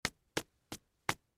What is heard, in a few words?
Clothes Dust Sand From Pants Pat Hard; Brushing Sound Effects; Human; Foley; Blastwave